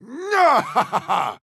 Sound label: Attack Large